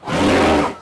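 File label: Elephant Attack Vox; Civ4 warlords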